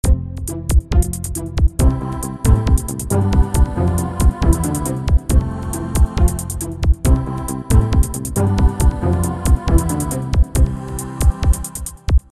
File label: Newage